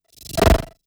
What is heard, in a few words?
Strobe Transition